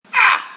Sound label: grunttt